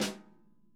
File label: snare; Sonic Reality; ID SNARE 3+